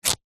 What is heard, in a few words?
fabric cotton rip